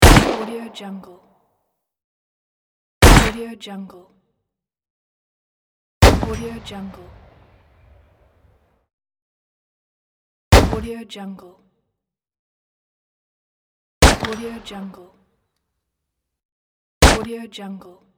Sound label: gunshot pack